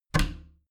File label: bathroom cabinet both close regular; objekte; tueren